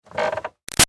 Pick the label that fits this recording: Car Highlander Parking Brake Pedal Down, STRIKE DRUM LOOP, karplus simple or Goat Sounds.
Car Highlander Parking Brake Pedal Down